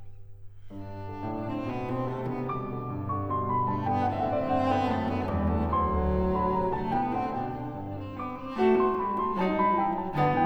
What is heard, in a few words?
Variations for cello & piano in G major; on Handel's ＂See, the Conqu'ring Hero comes,＂ WoO 4; Beethoven